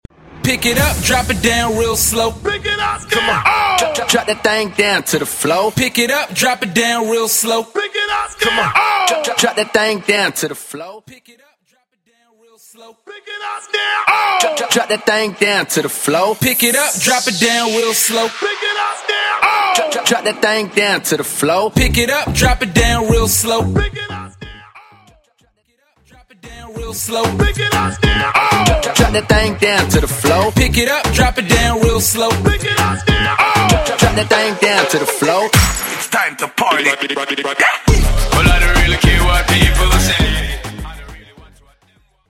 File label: Dancehall RMX; Acap In Hype Edit